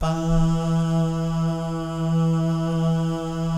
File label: BAH GUY, Vocal Planet Jazz & FX; ILIO; BAHS GUYS